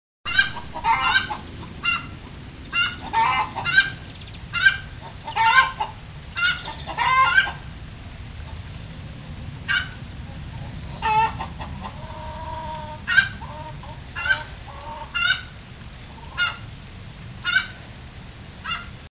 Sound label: Chicken Chorus